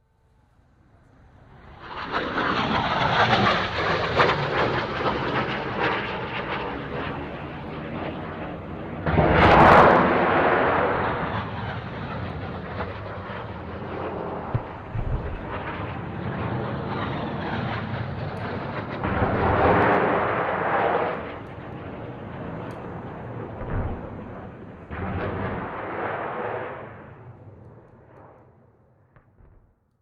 RAF Hawker Siddeley Harrier, three fly overhead firing rockets (some tank engine noise in foreground); Airplane Sound Effects; BBC